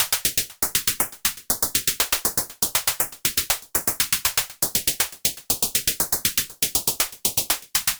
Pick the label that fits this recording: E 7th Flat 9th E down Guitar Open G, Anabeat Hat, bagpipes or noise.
Anabeat Hat